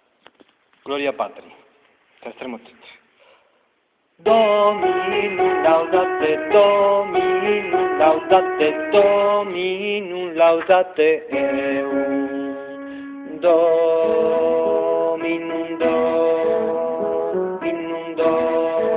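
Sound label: tenor; 3 motetes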